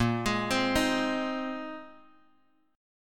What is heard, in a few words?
Bb 9th Suspended 4th Bb down Bass Standard; arpeggiated; JGuitar